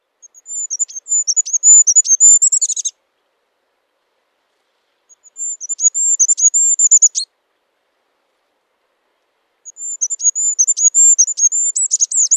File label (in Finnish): hippiainen laulua ja kutsua; Erittäin pieni, lyhytpystöinen, vilkasliikkeinen lintu; bruun